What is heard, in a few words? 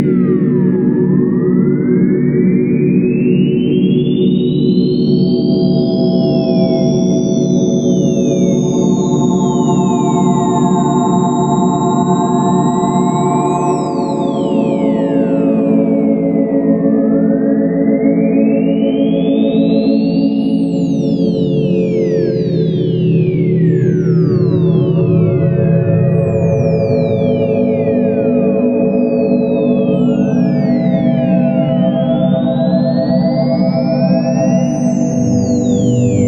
Sounds of Space; Julian Ray; Space - The Finest FX